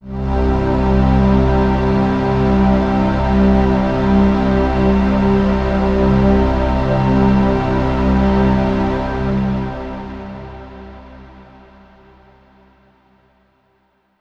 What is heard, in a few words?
Downtown Overlay Drone; Mirror's Edge Catalyst